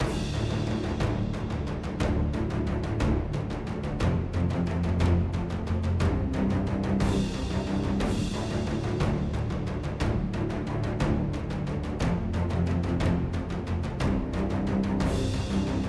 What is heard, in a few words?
Action 4 (Loop), Rpg Game Tracks; 25 Rpg Game Tracks